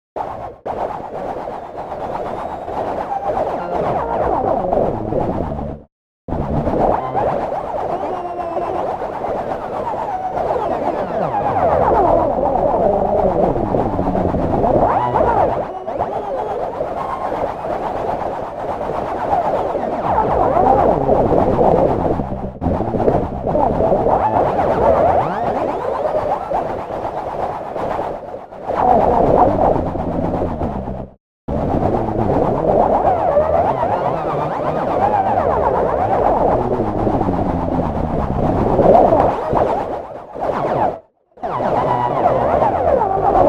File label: random parameter keyboard mash